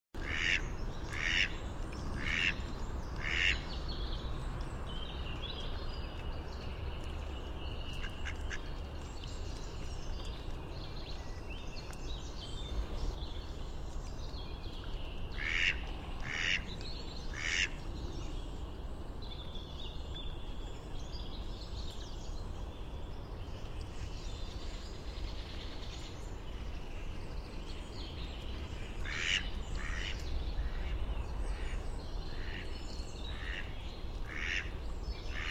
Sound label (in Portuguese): Canto do Pato real